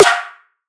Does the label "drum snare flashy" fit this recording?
Yes